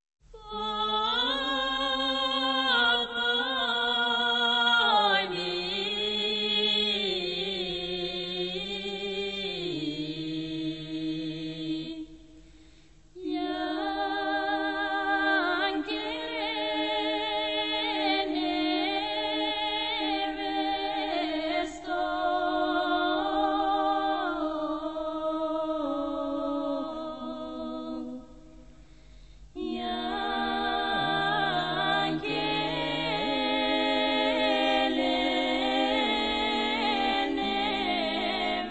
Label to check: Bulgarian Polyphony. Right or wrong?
Right